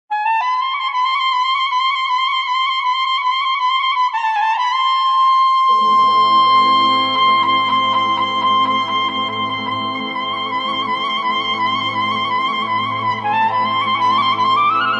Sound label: clarinet